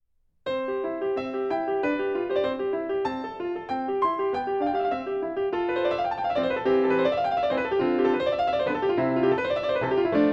Piano Sonata; Nodame Cantabile; C Chouchou K545

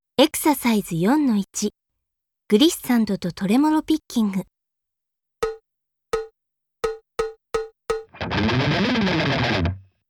B Mellow Glissando (Tremolo Picking); K-ON

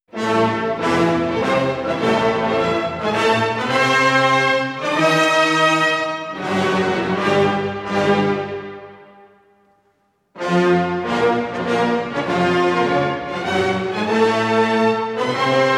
Seventh Symphony; fourth major movement (Bruckner); Legend of Galactic Heroes; Galactic Empire SIDE

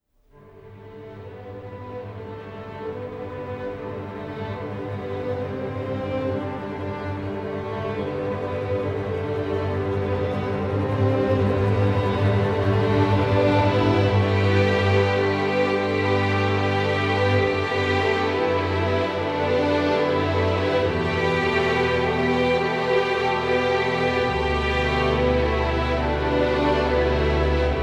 Kaze o ukete (instrumental); Konjiki no Gash Bell

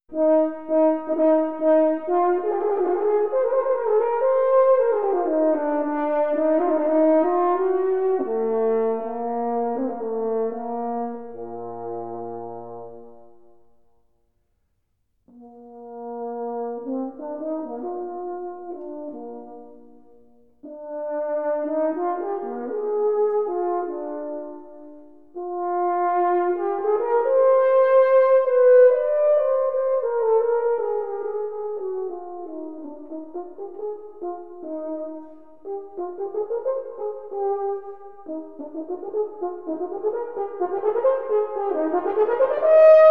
horn concerto; Huh major strange horn concerto (fragment) (Mozart); Legend of Galactic Heroes; Galactic Empire SIDE

